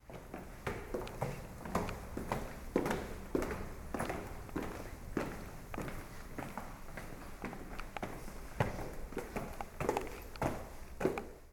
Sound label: Stairs